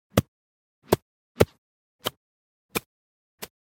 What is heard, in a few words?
golf club swing fail mono